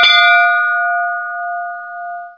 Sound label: box ring